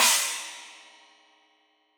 China, Crash, China Cymbal

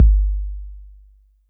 Roland 808 BD; Drums; Tr-808

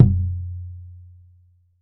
DEEP WAVE; E-MU Producer Series Vol. 7 – Old World Instruments

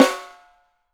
CAIXA NORM, BRAZIL

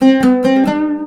LUTH J, Arabian Traditions, LUTH RIFF; USB Soundscan vol.43 - ArabianTraditions; AKAI

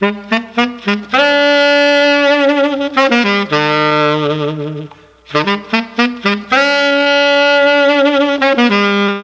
C#4 SAX, Mellotron; Mike Pinder - Mellotron Archive CD; FOXTROT+SAX

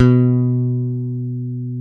B 2 PICK, BASS; AKAI S-Series CD-ROM Sound Library VOL-8

